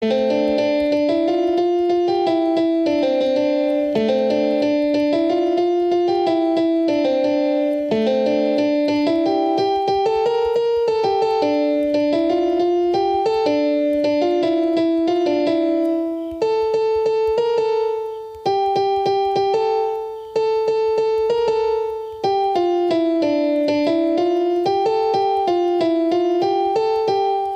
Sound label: Piano; Main Theme; Pirates of the Caribbean